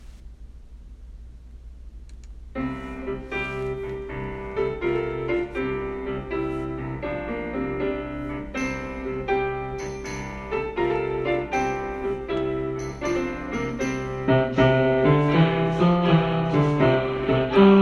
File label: Gaelic Alleluia Tenor